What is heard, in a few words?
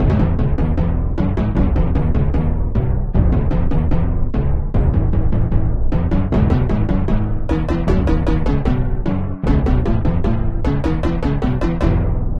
Drum; Sanctuary Score BT3